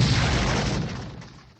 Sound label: muffled gun sound effect; sfx